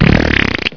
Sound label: fart; sven; syowa japan